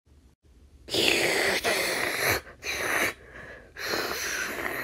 vacuum

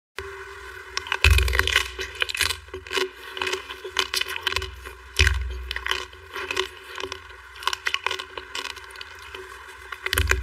Eating Crunchy Ice Cream

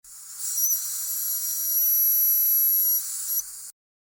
Dentist suction

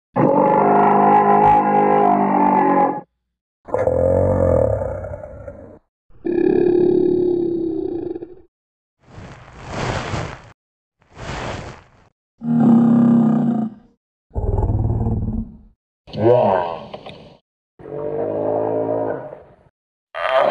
Kouprey; گاو کامبوجی

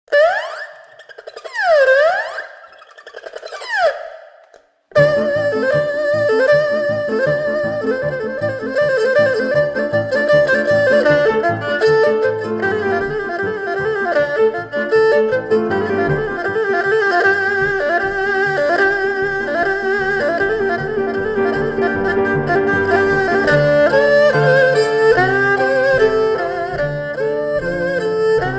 Erhu

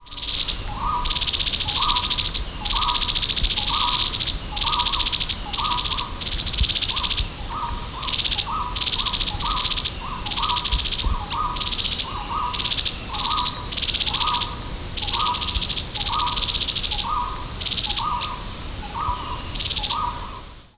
Striated yuhina, پرنده یوهین راه راه

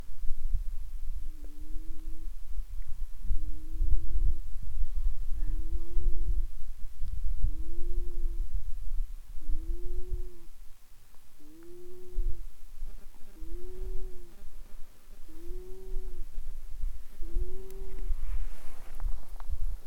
Plains wanderer

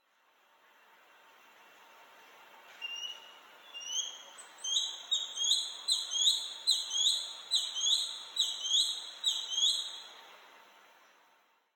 Crested goshawk

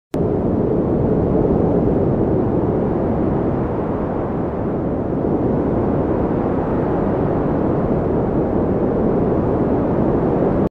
Foggy environment

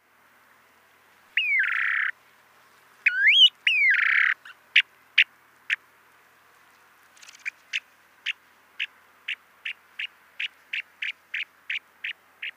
Snowy plover

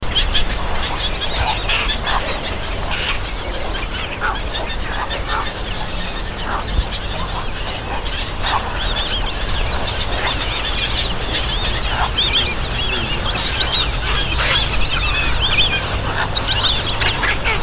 Dimorphic egret

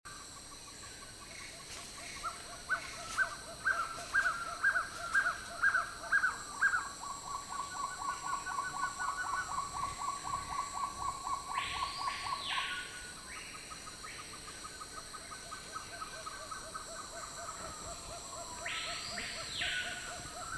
Black tailed trogon

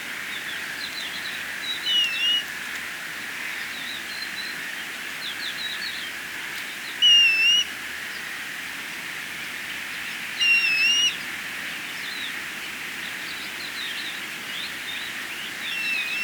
Grey plover

